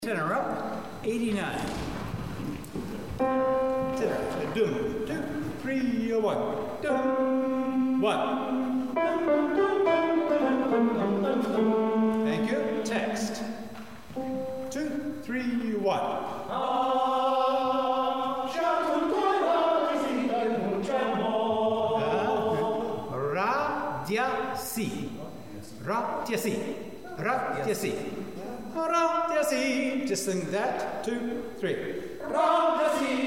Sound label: Bell Chorus T drill; rehearsal files